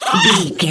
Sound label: goblin fairy attack